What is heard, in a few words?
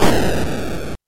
Dying